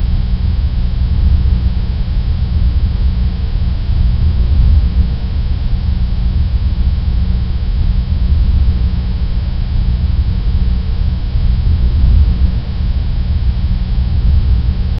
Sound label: ambience sound effect, liminal